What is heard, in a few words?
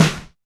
Snare, Alesis DM5 Drum Machines